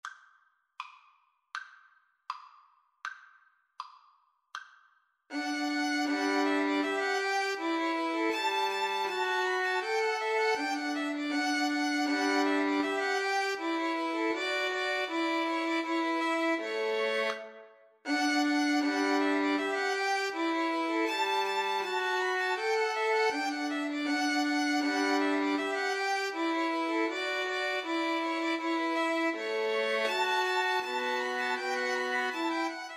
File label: Violin Trio; Bach Gavotte Cello Suite 6